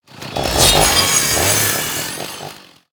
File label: Chain Saw Medium Play